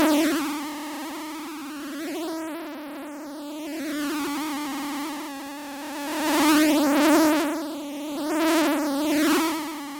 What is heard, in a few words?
Fly, synthesizer